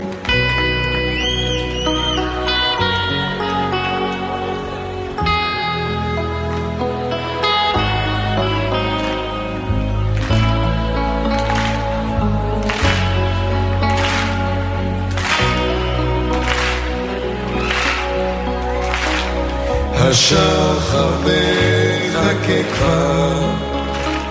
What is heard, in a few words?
Duet